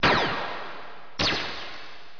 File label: scifi